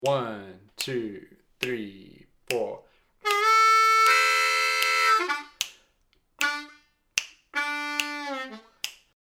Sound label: Lick Slow, harmonica